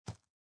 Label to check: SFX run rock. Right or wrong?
Right